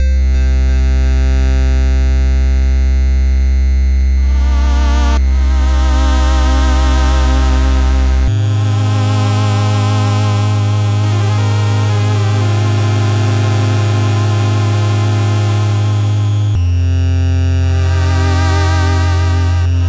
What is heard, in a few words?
Dramatic Tension; silver grapple original soundtrack 2017